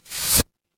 paper fabric fabric

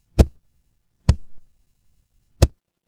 transition braam

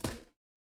paper fabric inventory

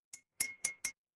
glass clink

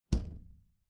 retro game hit

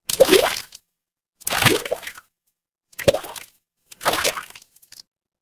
water splash

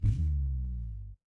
ui power on

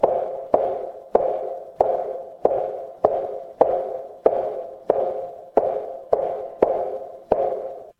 footsteps heels

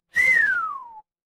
cartoon slide whistle

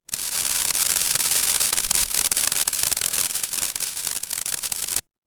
fire electric spark

